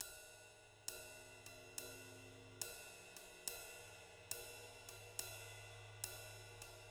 JZ70 SWING, Living Drums

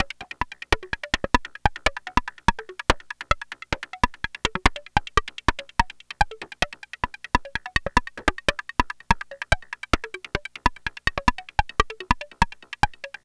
Percussion; Drums & Percussion; Electronic Point Blank

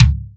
XV Kick, Roland XV-5080

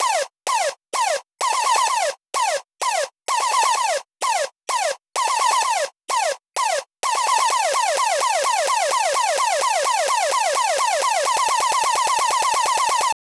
Fills 128BPM, Clubsounds

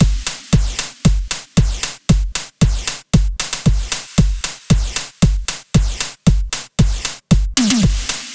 115 Beat; Brody; Zero-G - Future Wave

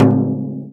FRAME, INDIAN PERC

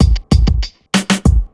BEAT, Burning Grunge Hip Hop; USB Soundscan vol.34; AKAI

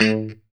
POP A 2, STRAT guitar; Spectrasonics; Hans Zimmer Guitars VOL-1; JC-STRAT POP

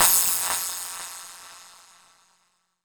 Fuzzy Crash, Drum Hits; Zero-G; Soundclash

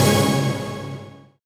SYN DANCE; Sound & Vision - Gigapack II

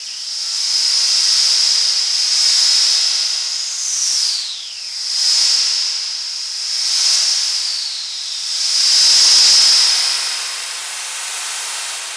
Steam Room, Environments; Sonic Foundry (Sony Creative Software); Myths of Technology

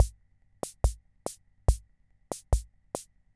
Keynote MR-101 Auto Rhythm Loop

